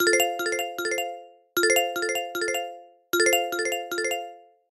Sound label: Ringtone Background Music; Pond5